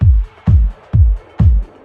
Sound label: Limo Music; Burnout CRASH!; PS3; Xbox 360